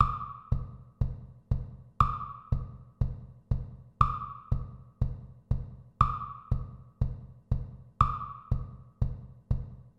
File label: two level quadruple metre dots; organisation of time metre